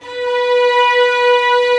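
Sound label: Vio legato B3; Digital Sound Factory Virtuoso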